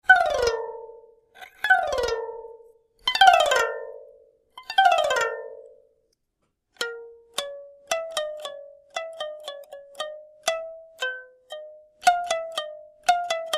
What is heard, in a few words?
crap harp